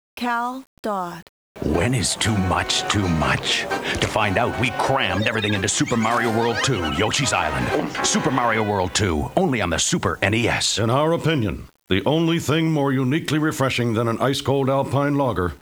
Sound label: jingles